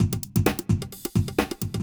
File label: electro kit 130